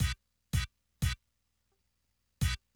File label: HIT, Hip Hop and R&B Drumloops; Best Service ProSamples vol.01; AKAI